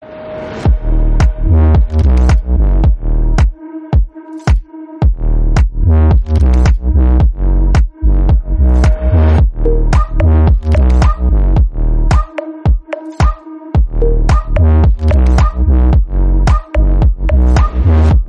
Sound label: action dance beat